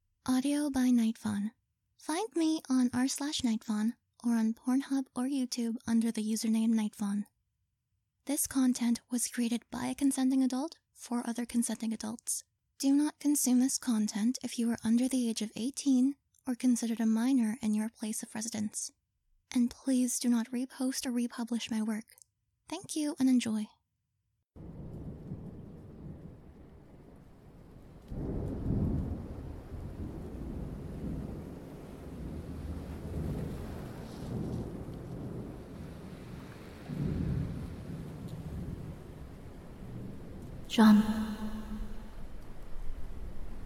Singing With but you're a sailor that's been saved by a deep sea siren; Disclaimer